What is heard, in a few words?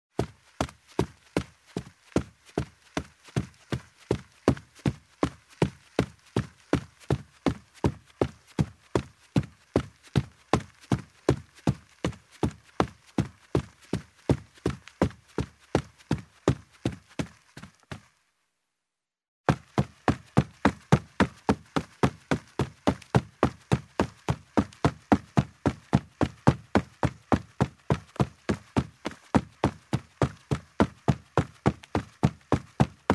Running in shoes on a carpeted wooden floor; steps